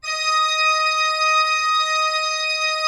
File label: D5, cathedral organ; Propeller Island